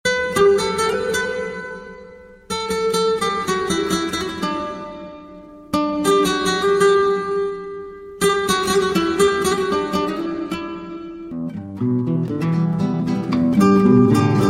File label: Intro Guitar